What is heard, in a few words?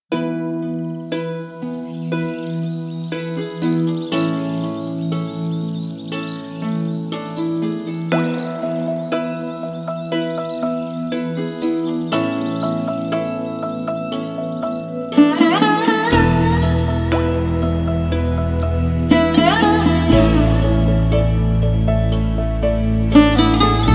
Winds & Vibrations (BGM); Meiyazhaki